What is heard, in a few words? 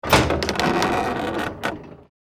Ship door hatch open